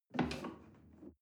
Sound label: chair wood take soft